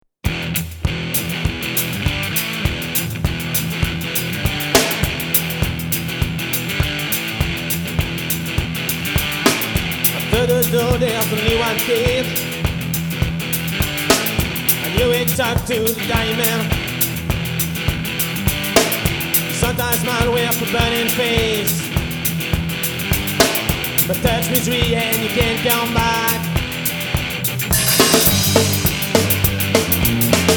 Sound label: dying man